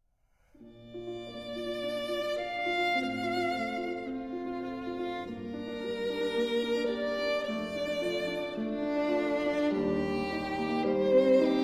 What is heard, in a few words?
Romantic Pieces, Allegro moderato; Dvorák; 4 Romantic Pieces, Op. 75, B. 150 I.